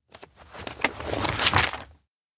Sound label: dictionary open; anemoi